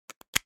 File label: technology player small usb cap place back on; mp3 player; zapsplat